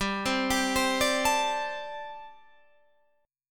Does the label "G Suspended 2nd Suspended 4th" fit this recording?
Yes